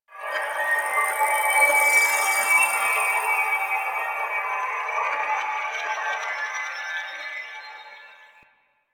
Interior Texture, Ambience

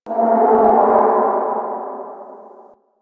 interior distant creature groan; Ambience; Misc Indoor; DE; Warframe; Infested Eris

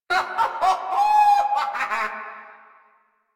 Laugh, Grineer Queens Guards; Warframe

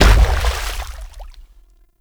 Infested Step Water, Enemies; Warframe; Femur

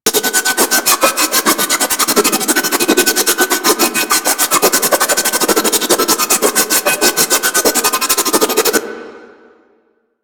Glaive Head Circle Loop; Warframe; Weapons; Ghost Catcher

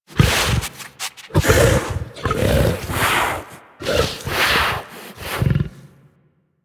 Juggernaut Truffle Sniff, Infested; Warframe; Truffle Hunt

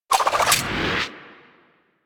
Grineer Crew Ship Pilot Finisher 3D; Warframe; Rail Jack

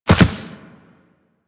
Land Soft Dirt, Footsteps; Warframe; Operator

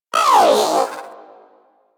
Vocal Pain; Warframe; Pets; Zanuka

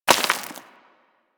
Bullet Impact Debris Rock; Warframe; Weapons

